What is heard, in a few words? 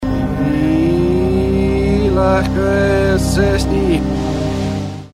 electricity